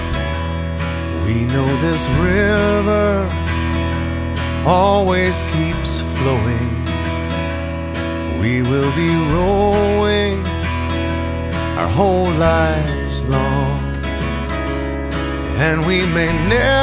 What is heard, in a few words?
Singing a Song